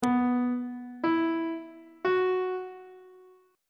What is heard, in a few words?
c flat suspended 4th triad chord intervals on treble clef